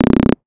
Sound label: Star Instruments Synare PS-1